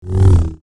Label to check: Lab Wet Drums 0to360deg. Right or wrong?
Wrong